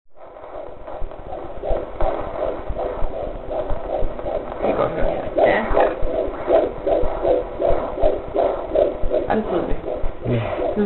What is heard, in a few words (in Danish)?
Puls 160